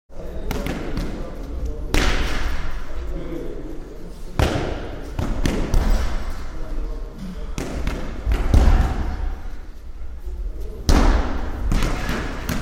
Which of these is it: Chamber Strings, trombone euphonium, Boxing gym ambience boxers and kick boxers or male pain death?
Boxing gym ambience boxers and kick boxers